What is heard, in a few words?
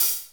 Alesis Half Open Hihat; hr16 stereo